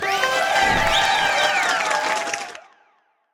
Sound of Fanfare as it hit; Mr. Blaster

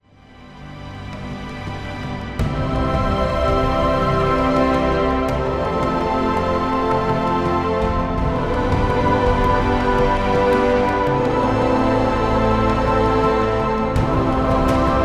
Soul Music; Soul Music Flyup; Alien Flex

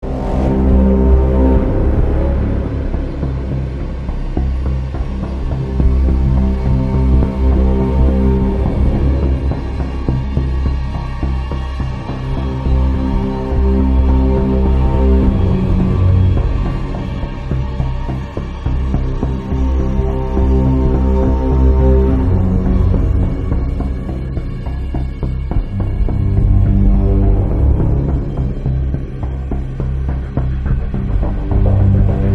Mx Office Necros

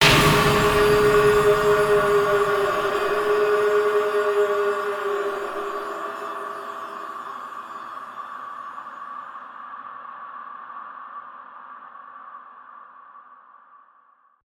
corridor ghost appear; Grim Tales: The Legacy; corridor lattice

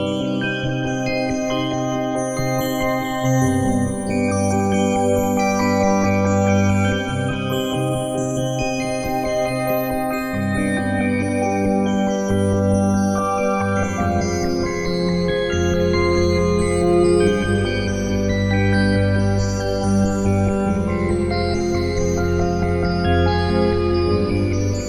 Strange Shop Looping; Strangers of the Power

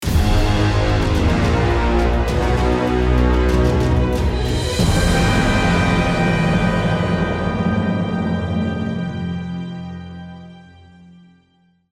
Mx Snow Beast Combat Outro

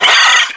mole hit, water balloon; Birthday Party Bash; Wii

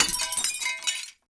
glass break

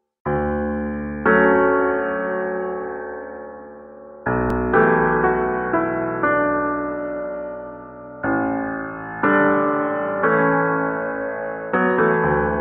Magik Pond

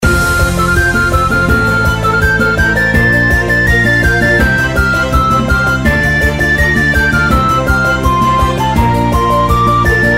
Raid; Mist Train Girls

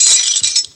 Drop Keys; Prison Architect

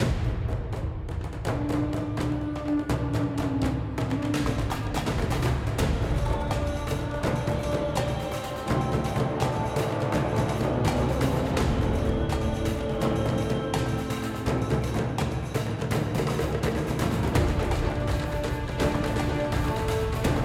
Battle Incantation

